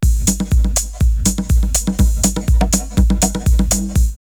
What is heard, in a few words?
Ahh Conga 122, drumloops Techno House; X-Static Goldmine